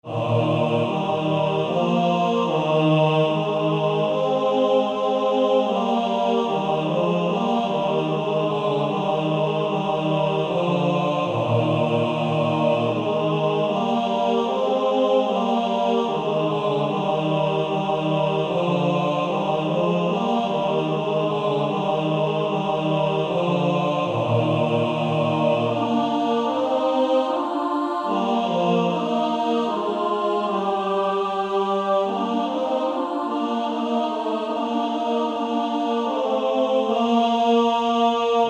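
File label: Male Choir tenor bass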